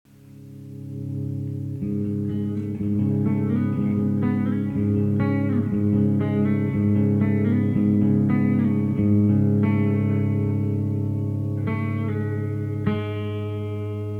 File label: Soft and Low (Instrumental); rock